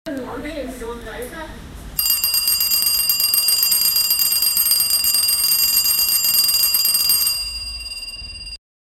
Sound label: the sharp sound of a school bell signaling the end of class